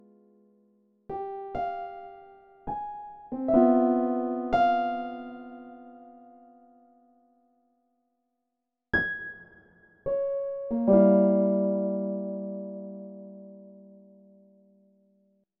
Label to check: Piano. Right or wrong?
Right